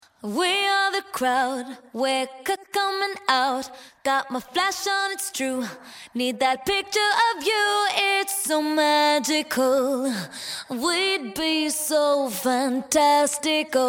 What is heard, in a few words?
Acapellas